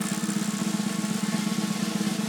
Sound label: Diegetic Music Amusements Cannon Drum Roll